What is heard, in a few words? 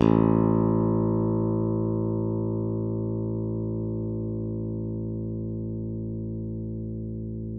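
Yamaha open, Bass; Masterbits CLIMAX 7; PATITUCCI BS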